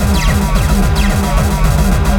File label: drum loop